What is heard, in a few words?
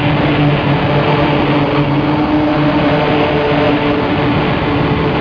drive, vehicle